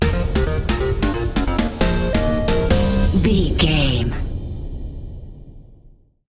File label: epic video game mission stinger